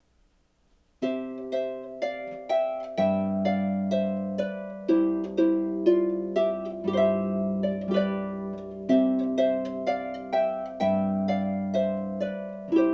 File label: Harp